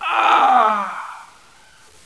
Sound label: hdeath, hostage